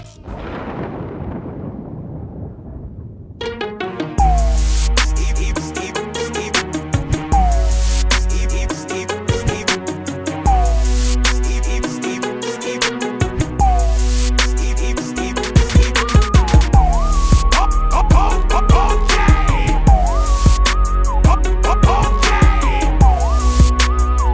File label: dirty south